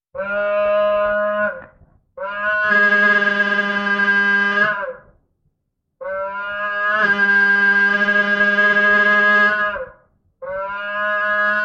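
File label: car horn in form of cow mooing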